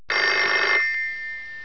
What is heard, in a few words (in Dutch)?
telefoon